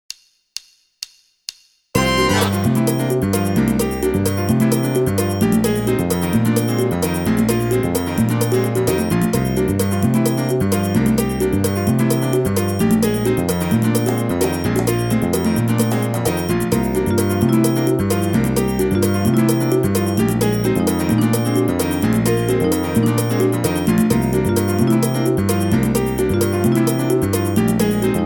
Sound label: Backing Latin